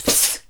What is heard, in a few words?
NOISE, CLASSIC VOX; Best Service; Hallelujah